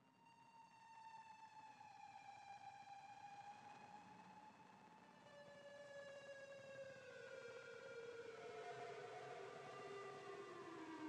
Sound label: abrasive synth